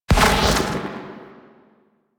Hand Slip Transference; Cinematics; Tenno; Warframe; The New War; Throne Room

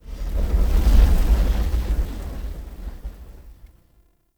Invis Rune Active One Off; Powers; Runes

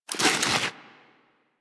Armadillo Roll Snow, Foley; Warframe; Hunting Critters

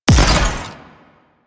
Conduit Proj Explosion; Sentient

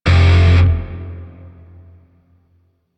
Shawzin Maj Chord G; Grineer; DE; Warframe

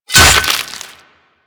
Axe Impact Flesh; Warframe; Weapons

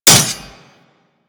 Dagger Metal Impact; Dual Daggers; Hit Effects; Weapons

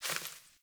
Sparrow One Wing Flaps

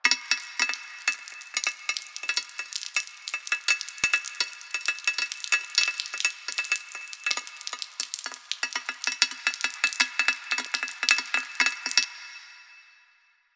Cave Drips Metal Mono Loop; Ambience; Warframe; Stalker Cave

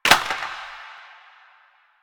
Plastic Drop; Ambience; Warframe; Nineteen Ninety Nine

